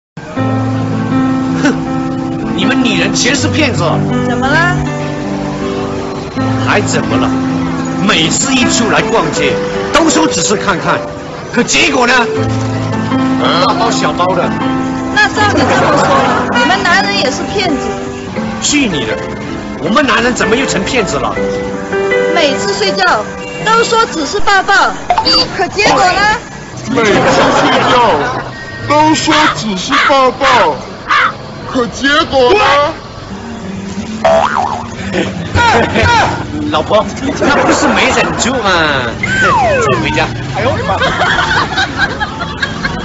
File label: laugh; breadradio